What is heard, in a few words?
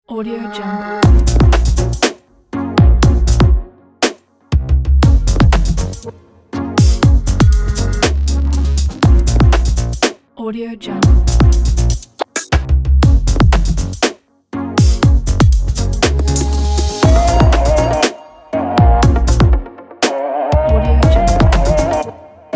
background abstract technology